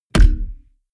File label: heavy bass saturated damped, hits impacts; whooshes rises